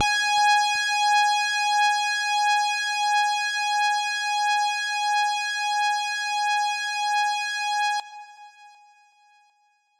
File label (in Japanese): 音程 男性 G4